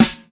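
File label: classic hip hop Dry snare; STYLE KITS (Hip Hop, Etc); Classic Hip Hop Mega Kit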